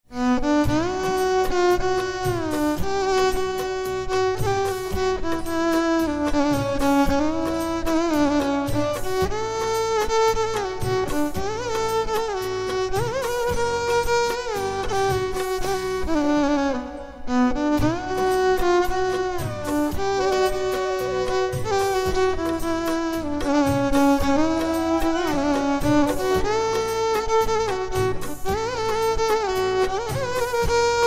Violin

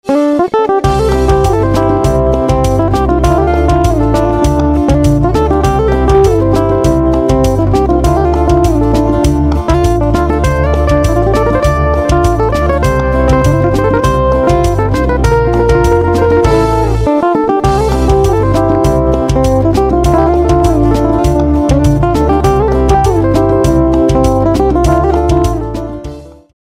Guitar

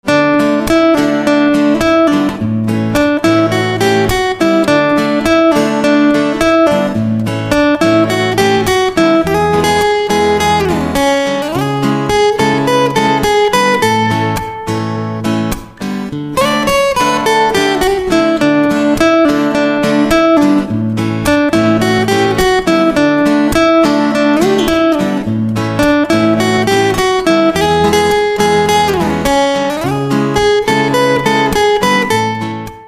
Guitar

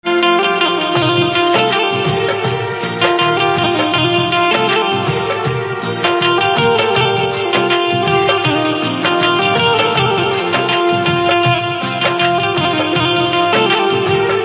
Banjo

